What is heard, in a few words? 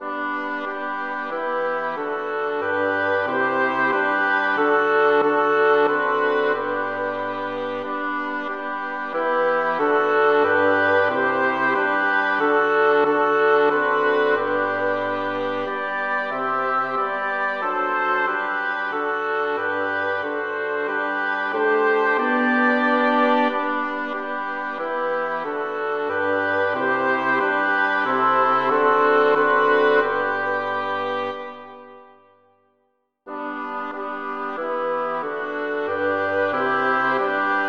polyinstru; hymns; Himnario grande